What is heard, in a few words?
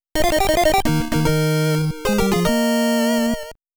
Power-Up Fanfare BGM